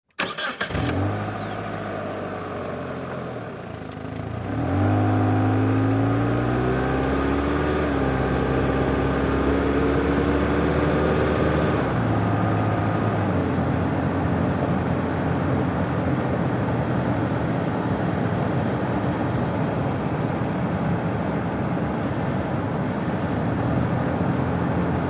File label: Sedan Eng Accel Sto